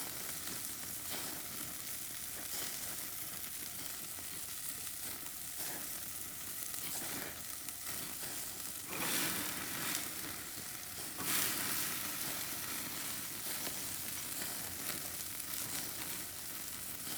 ambience room butchery working layer; Shelter

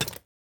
ui formation

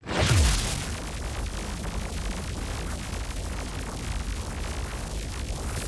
Drone Laser Loop; TANK; 3Souls

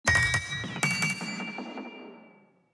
building upgrade stinger

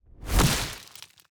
stone golem little strike; Shelter

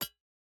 environment pickaxe hit iron

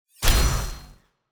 Orbital Dagger Gravygun Reaction; TANK; 3Souls

